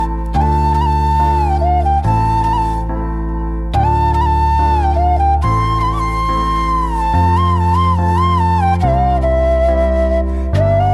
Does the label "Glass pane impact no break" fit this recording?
No